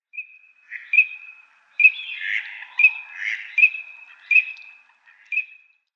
Krickente Anas crecca Balz; Vogelstimmen

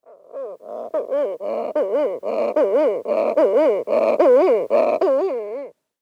Dunkler Sturmtaucher Puffinus griseus; Vogelstimmen

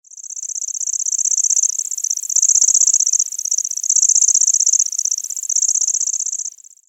Strichelschwirl Locustella lanceolata; Vogelstimmen

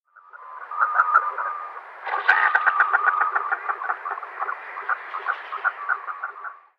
Gaensesaeger Mergus merganser; Vogelstimmen

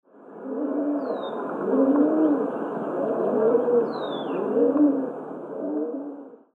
Felsentaube Columba livia; Vogelstimmen

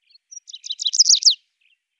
Bergammer Emberiza tahapisi; Vogelstimmen

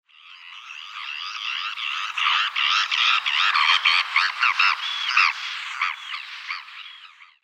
Schwalbenmoewe Larus sabini; Vogelstimmen

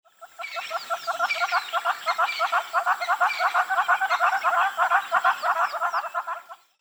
Rotschnabeltoko Tockus erythrorhynchus

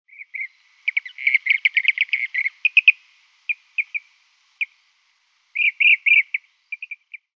Blauwangenspint Merops persicus; Vogelstimmen

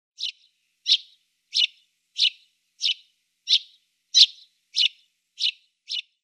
Feldsperling Passer montanus; Vogelstimmen

